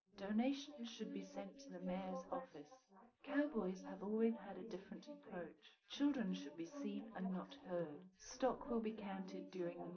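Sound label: female, live recording 250ms 5cm; SiSEC11; underdetermined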